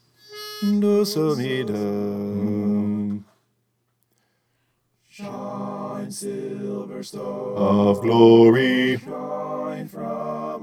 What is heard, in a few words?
bass